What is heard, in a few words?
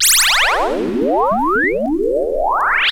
WHOOSHES; AMG Megabass REMIX! VOL-2